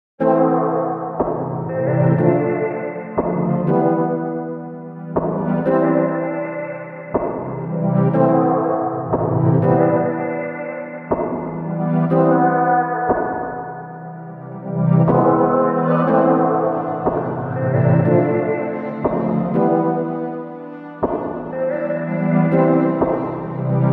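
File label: Major Melody 121bpm A minor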